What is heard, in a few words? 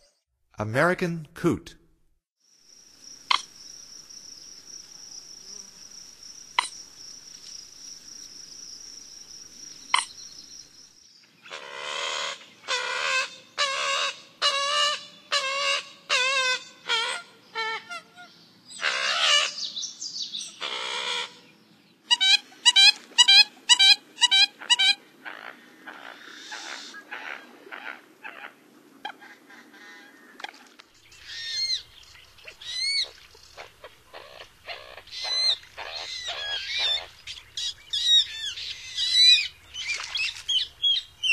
American Coot; North America; Stokes